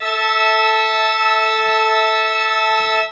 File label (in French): quinte du loup